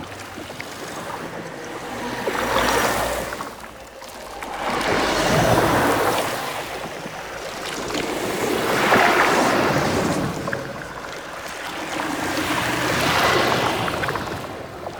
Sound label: Void Energy Particles Loop; Warframe; Ambience; Entrati